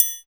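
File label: HARD TRIANGLE MUTE; TRIANGLES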